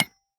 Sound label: Dragon Lead Hit